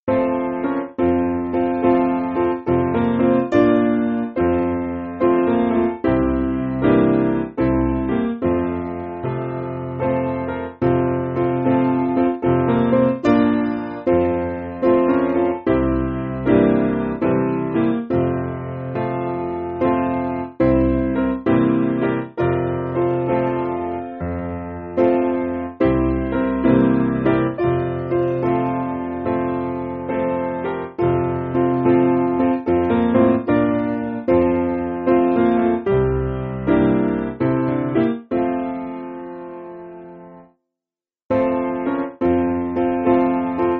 Piano, Backing Files for Churches

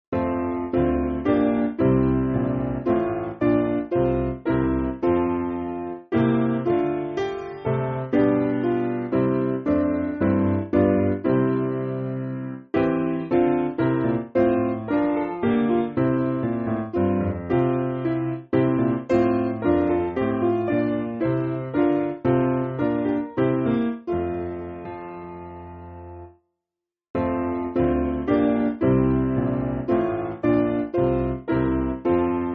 Piano, Music Backing Files for Churches; Nurnberg

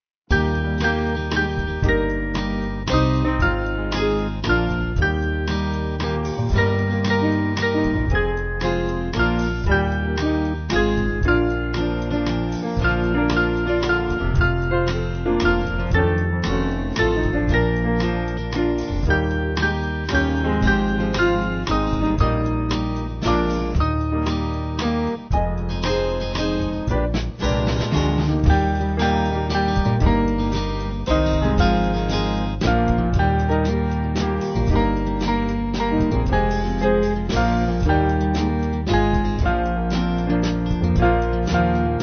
Band

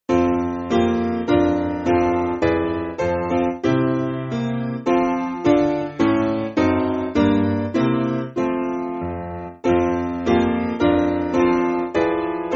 Softly Now SPiano

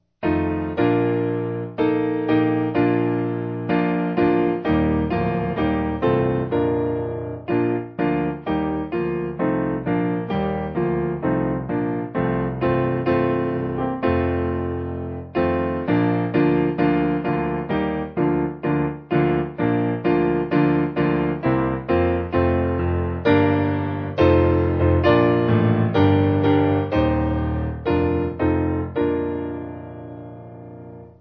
When Mothers Of Salem, Piano; 4/Bb

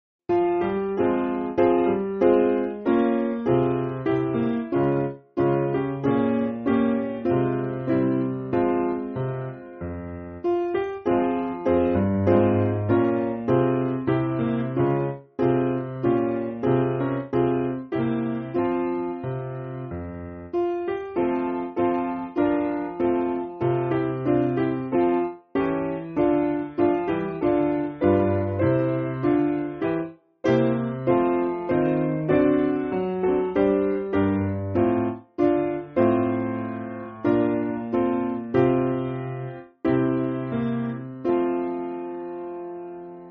Piano, Free Music Backing Files for Churches

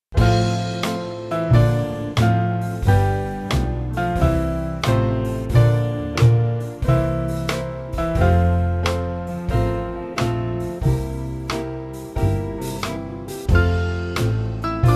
Band; Ar Hyd Y Nos

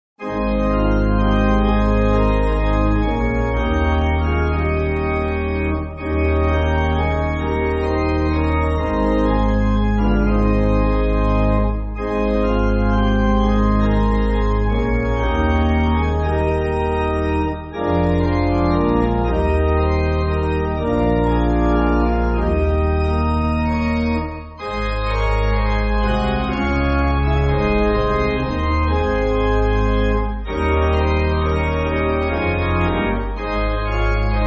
Pipe Organ, Music Backing Files for Churches; Friends All Around Me; Loes; Dixon Loes

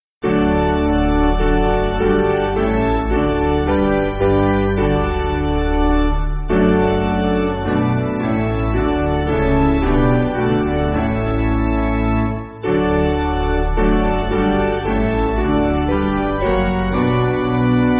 Piano, Free Music Backing Files for Churches

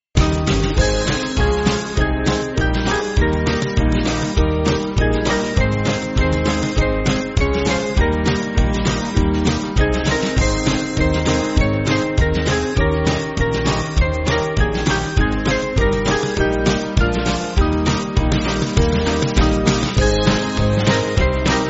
Band, Music Backing

